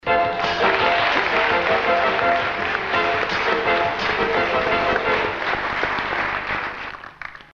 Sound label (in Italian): stacchetto